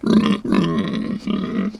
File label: WARN